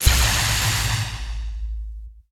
hyper zap

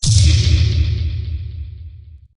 bomb appears; Bej Classic

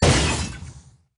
small explode; Bej Classic

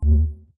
unused tooltip; Bej Classic

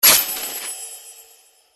explosion

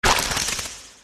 JackOLantern explosion

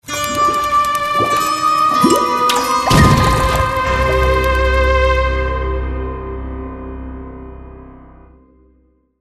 Cauldron prestige

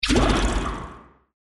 Cauldron prize end

